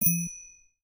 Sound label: Bicycle Bell; Samsung Galaxy Z Fold 7; Retro